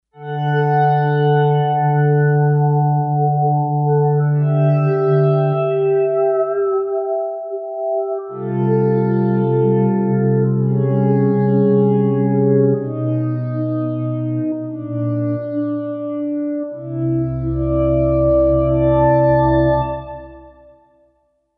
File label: BlurrPad